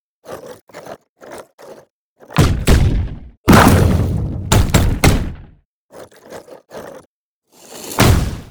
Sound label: golem angry; LoL; monsters